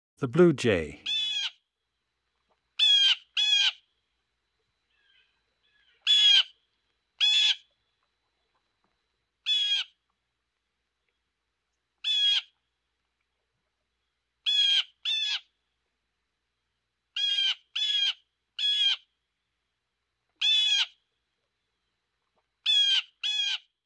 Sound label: Blue Jay; Eastern North America; Dan Gibson; Wildlife Identification By Sound